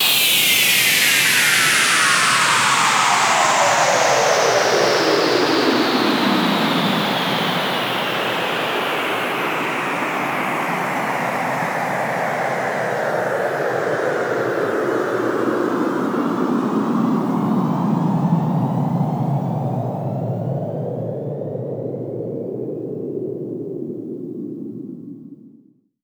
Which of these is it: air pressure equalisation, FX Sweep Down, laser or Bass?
FX Sweep Down